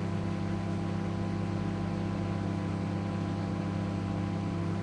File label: Microwave Whir; Lethal Company